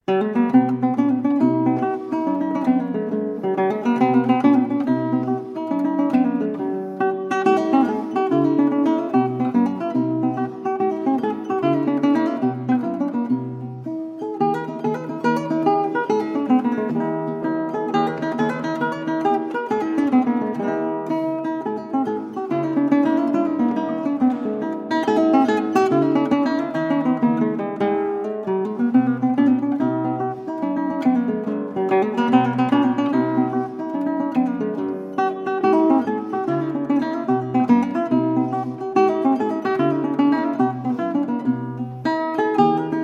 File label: Guitar Sonata in C Major, MS 84 No 15 II Perigordino Allegretto; Classical Guitar